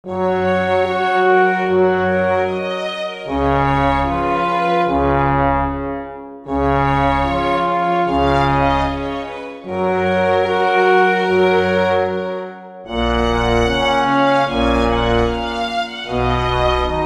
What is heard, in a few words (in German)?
Morgen muss mein Schatz verreisen Bass; Hamburg ist ein schönes Städtchen; ABREISE